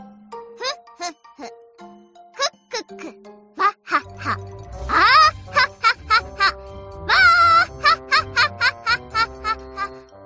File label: wahaha